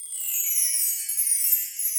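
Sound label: Chimes B Stick down; Latin Percussion; Drum Hits; Bell; Ableton; Factory Packs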